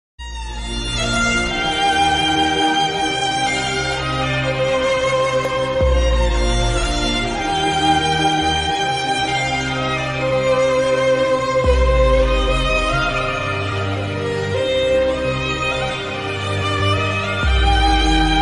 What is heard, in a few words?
sad bgm movie bgm ringtone tamil ringtone; raja rani